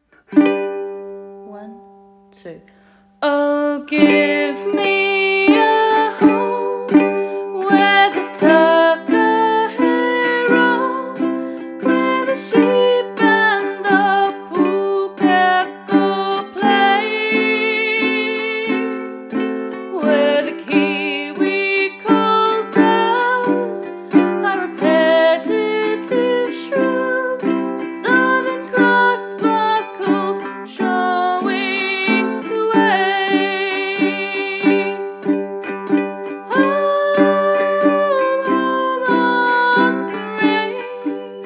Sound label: Uke; home on the range; Uke book